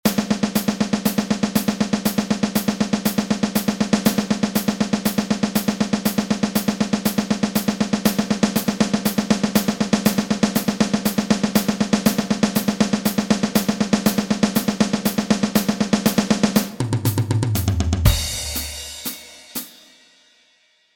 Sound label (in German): schlagzeugsolo fur anfanger